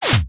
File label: zoom snap